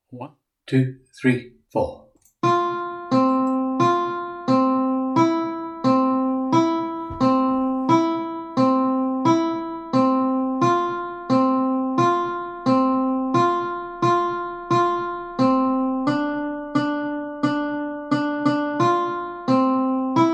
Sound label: Counter Melody